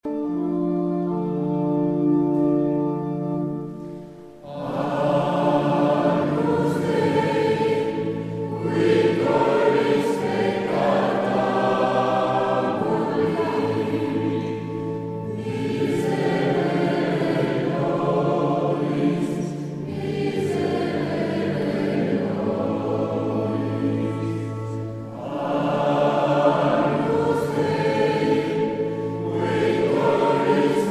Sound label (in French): Tutti; Agnus misericorde